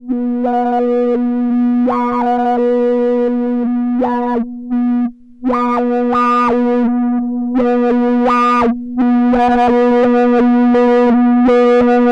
24dB LOW PASS Sample and Hold Distortion; GRP Module

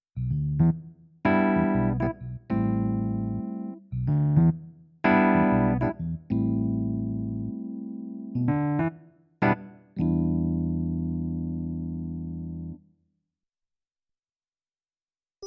EPiano

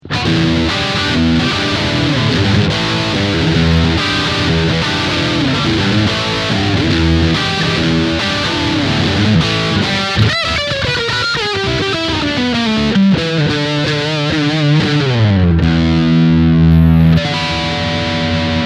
Classic Rock Heavy

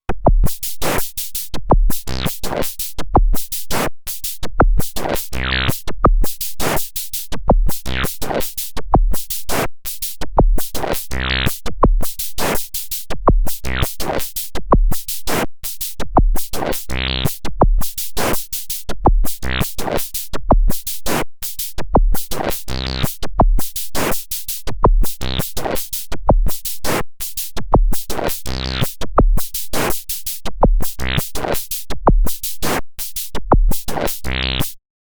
Dreadbox Polyend Medusa Drummy

